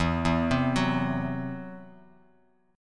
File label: E Suspended 2nd Sharp 5th E down Bass Standard; Esus2#5; arpeggiated; JGuitar